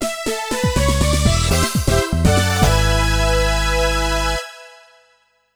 Strike; Super Monkey Ball 2; Monkey Bowling 2

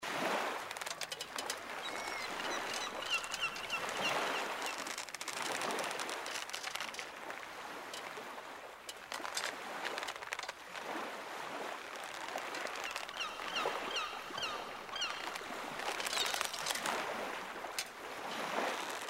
Dock Environment; U.B. Funkeys

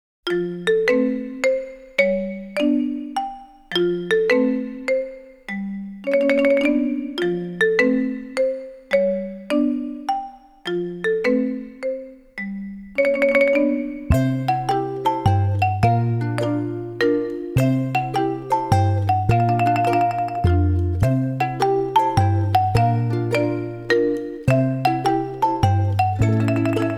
easy-going morning marimba; asa kara nonbiri marinba

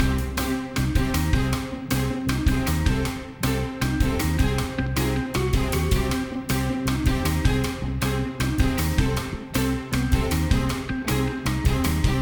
City Platforming MIXED; ben 10

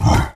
rhino snort

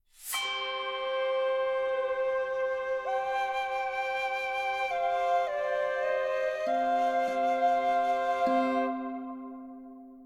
End Music Stem

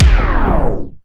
DJ Disco Stop

